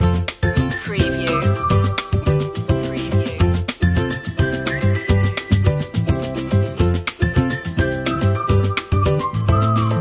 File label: easy life loop